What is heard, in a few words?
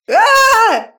Vandal Fright